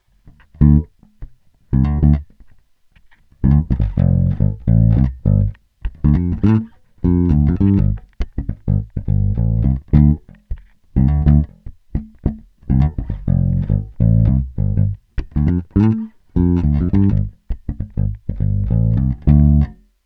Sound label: Fingerstlye Tone Up; 916 Super Neo